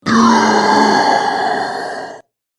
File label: Zombie - Last Breath; Zomby Sound Effects; horror